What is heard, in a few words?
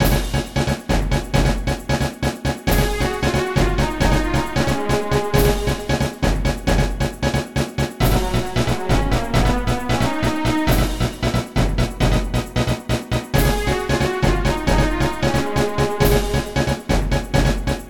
news battle; Undertale